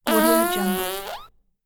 fart

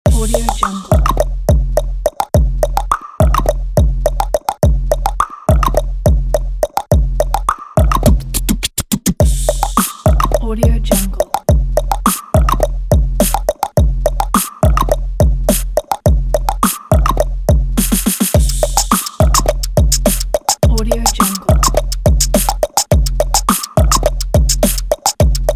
for beatbox pack

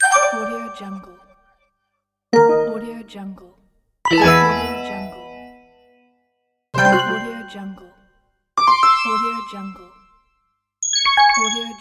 user interface games sound

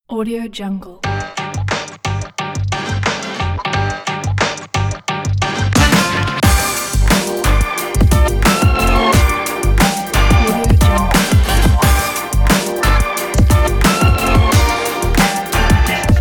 hiphop

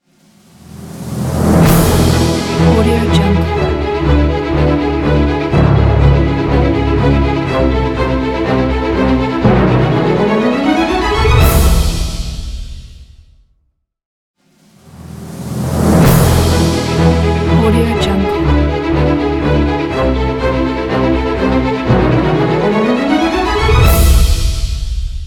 motivational jingle ident

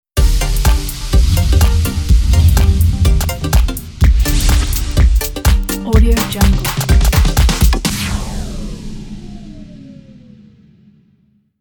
dance logo

